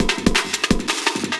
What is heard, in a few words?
LOOP, 170 BPM; Ueberschall; Drum & Bass Flash Trackz